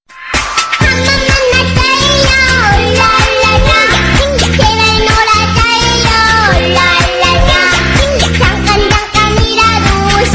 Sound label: cute asian kid song; children